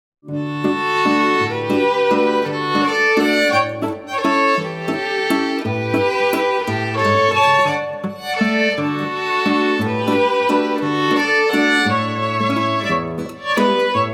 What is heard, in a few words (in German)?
Seewiesenwalzer; volksmusik